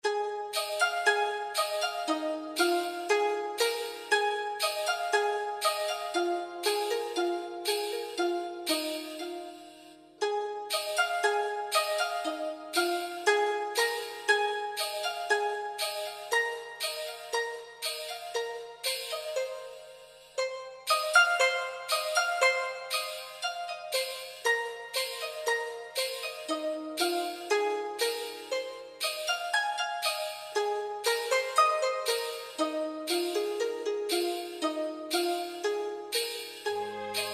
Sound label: Chinatown Underscore